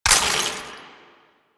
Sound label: Ammo Pickup Radiation; DE; Warframe; Warframes; Frumentarius; AmmoAbility